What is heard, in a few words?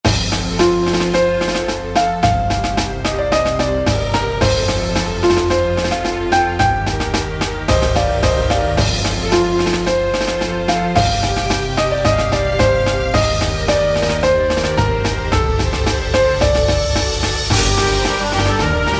victory